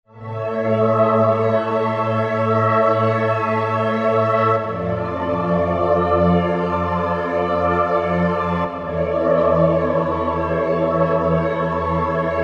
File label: Roland V-Synth; Paddington; Factory Patches